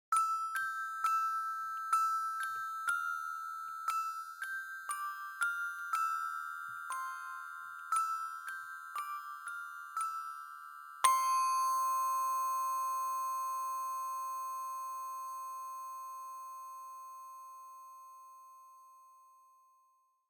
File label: Music Box